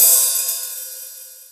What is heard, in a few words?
Ride, Roland D-70; Drum Samples